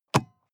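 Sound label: sports car interior compartment close medium; verkehr transport; autos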